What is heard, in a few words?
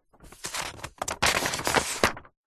household book wordsearch magazine page turn; zapsplat